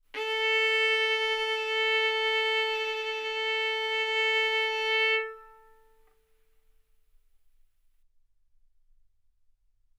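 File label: Violin arco mf A string A4 B4 stereo; sul A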